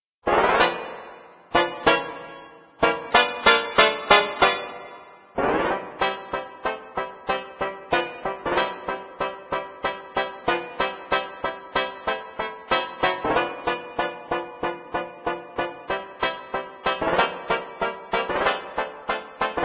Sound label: Banjo Duet When The Saints Go Marching In; Instruments; Zomboid; Lifestyle